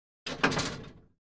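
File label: Gym Weight Equipment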